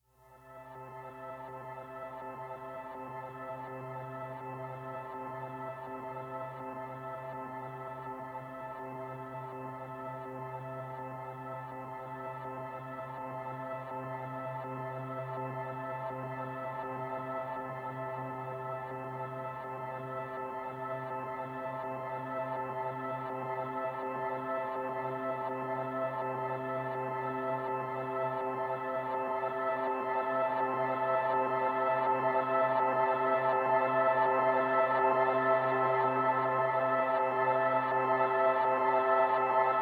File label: Mysterious Drone; Nordic Nights Drones